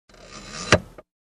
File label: Compartment being closed